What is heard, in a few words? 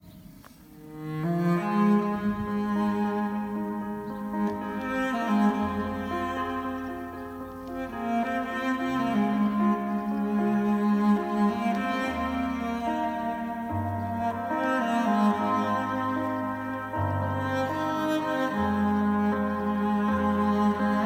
piano - cello